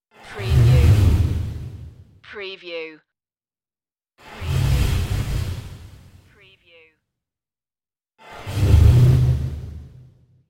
dragon fire bursts

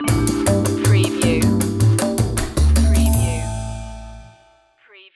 jazzy opener stinger

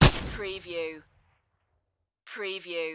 EXPLOSION MORTAR FIRE

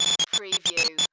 Digital Blips Short Circuit Text Receive

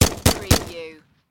Machine Gun HK416

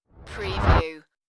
Rush

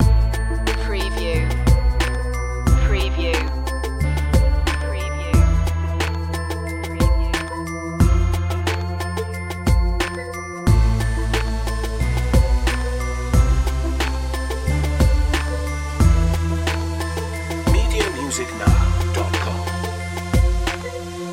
heating up loop

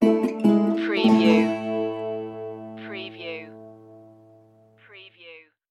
red fern grows stinger

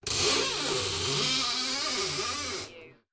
Shower Door Creak; beanstalk audio

